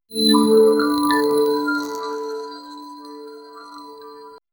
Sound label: smooth futuristic chill v, neon glow